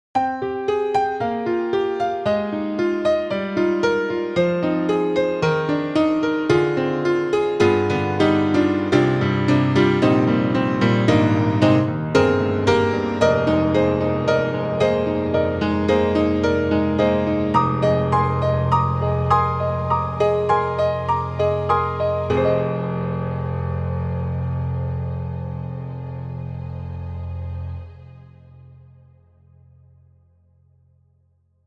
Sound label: Piano String Ensemble; Steinberg HALion 6; HS3 Combis